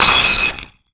Title Transition Communication Distortion; Adobe; Production Element

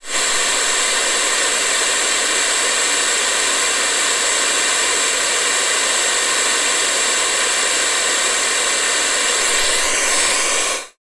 Technology Electronic Radio Static

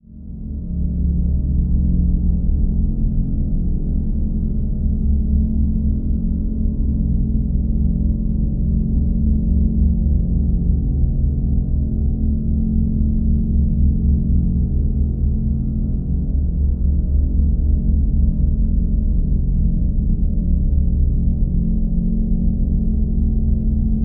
Drone Deep Synth Atonal Dark Mellow; Production Element Imaging; AdobeCC

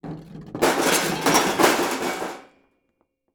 Rock Roll Down Wood Into Metal Pile; Crashes

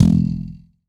Impact Metal Hit Springy

